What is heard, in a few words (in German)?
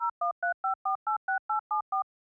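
Handy Wählton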